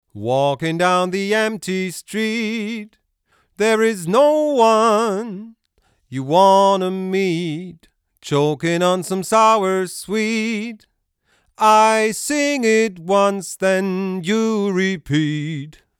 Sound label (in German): SSL 611 E DYN Vocal ohne Kompressor